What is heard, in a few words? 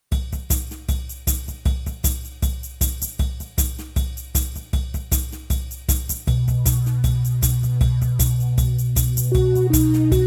Bottleneck Prelude